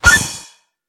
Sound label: Cherry Leaf Destroyed; fowl